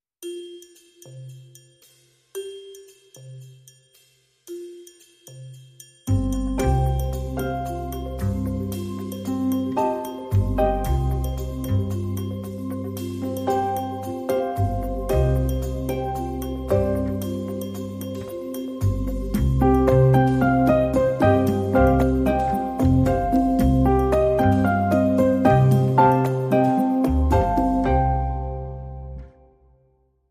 Urban Life

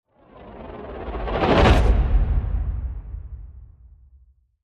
Cityscapes Trembling Flyby

